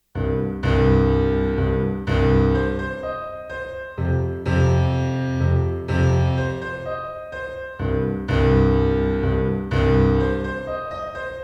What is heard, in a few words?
piano pop